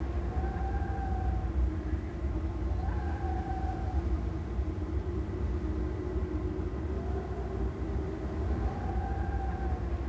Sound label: Tatooine wind, ambience; Star Wars Obi Wan; XBOX